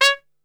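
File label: C#3 piccolo trumpet short, brass; Best Service; Brass Super Section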